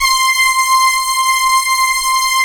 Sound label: BRAS, Moog Synths; ORSON; E-MU Formula 4000 Series Vol. 3 – Analog Odyssey